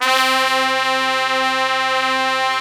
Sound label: C2, Section Horns